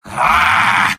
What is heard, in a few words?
medic negative vocalization; mvm